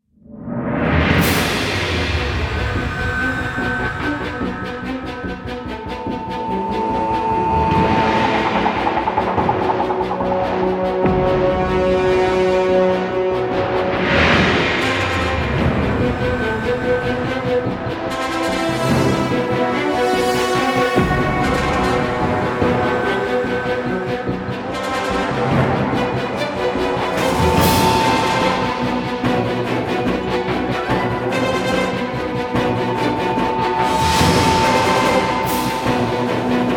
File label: Combat Dogfight; Elite Dangerous